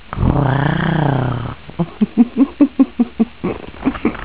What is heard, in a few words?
aly spurrrrrr